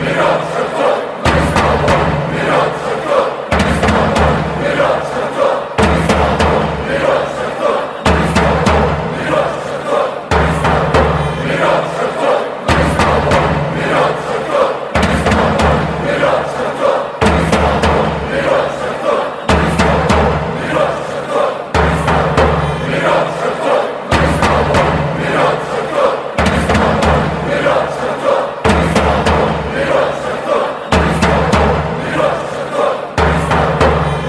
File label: go shakhtar, fanchants